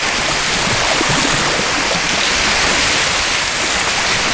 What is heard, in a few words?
Spin